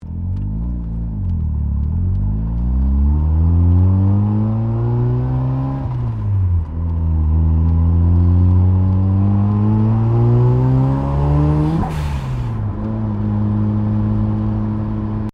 Car Mazda RX7 Series 3 Interior Accelerate From Idle Turbo Sound; 1983; Cars; Vehicles